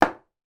household shaving gel can place down on wooden shelf